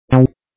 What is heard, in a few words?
synth